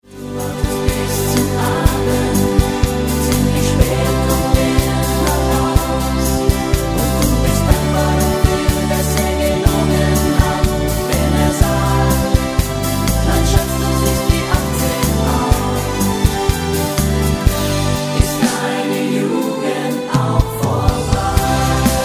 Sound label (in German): mit Chor